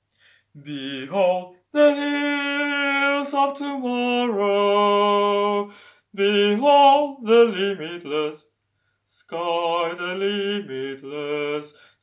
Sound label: bass